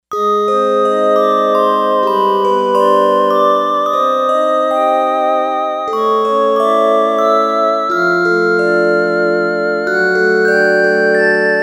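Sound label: Synth Ensemble 2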